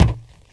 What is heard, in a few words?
Foot Metal; Player; Footsteps